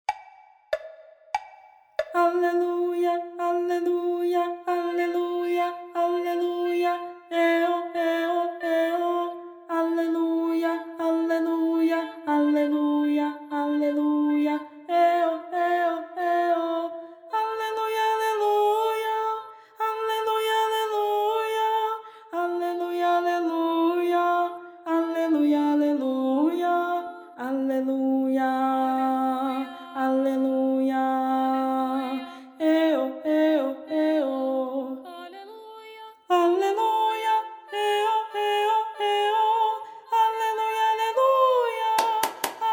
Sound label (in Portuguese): Aleluia VOZ; Voz Guia 2